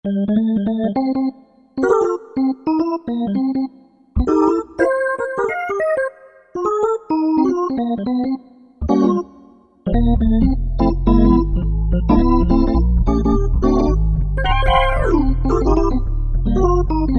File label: Super Jazz; Factory Combi demos